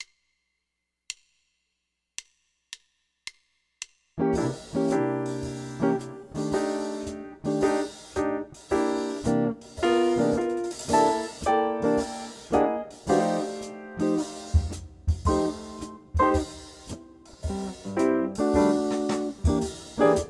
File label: Jazz Blues Bass Play Along; LMEA All-State Jazz